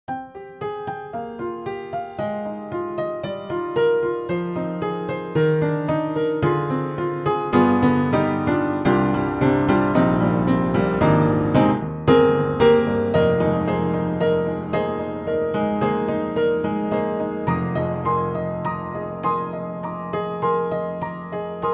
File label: Upright Pianos Vintage Upright Smoky Vintage Jazz Upright; Synthogy; Ivory II